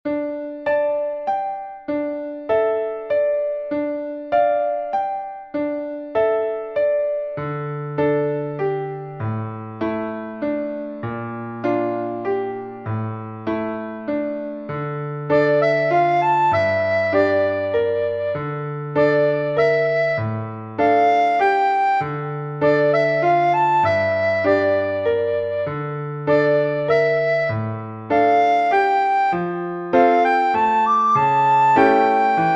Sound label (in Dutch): karaoke rustig tempo